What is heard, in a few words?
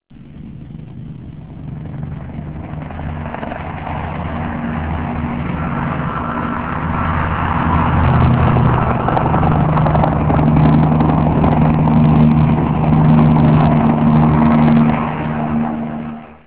chinook helicopter flyby